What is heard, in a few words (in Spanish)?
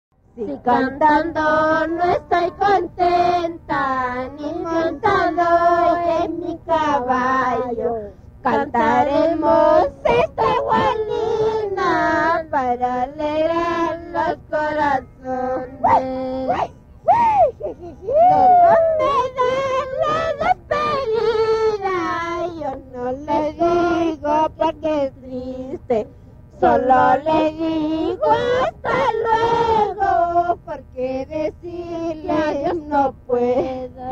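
Hualina canto jujeño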